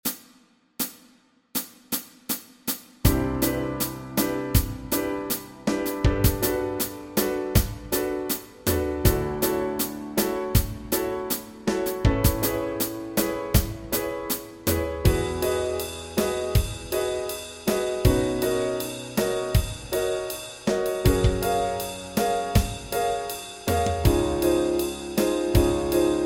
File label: Backing Track, walking bass